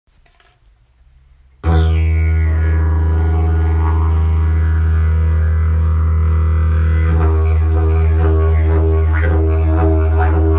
jasan tyrkys, didgeridoo

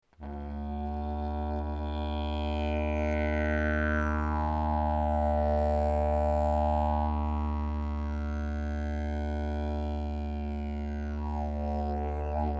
didg snek cestovni, didgeridoo

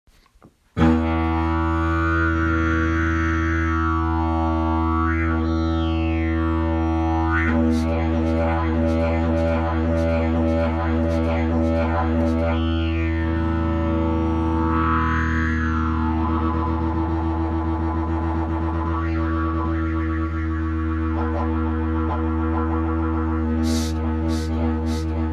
jilm, didgeridoo; sukovec

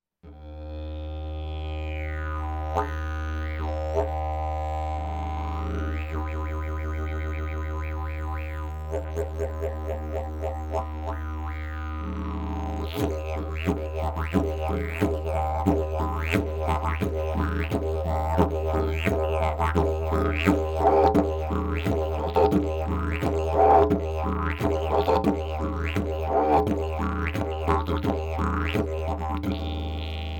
javor strom, didgeridoo

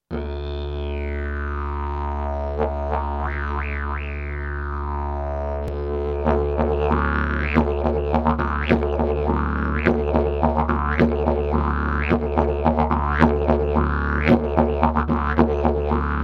jilm, didgeridoo; jilm duhovy

